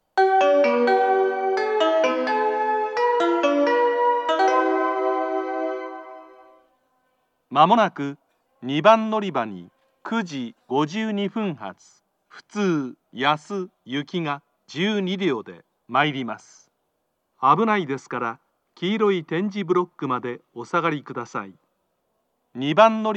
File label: local, kyoto, station; yasu